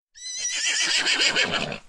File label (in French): Cheval, notification